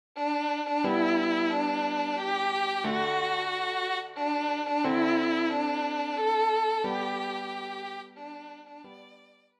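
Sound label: violin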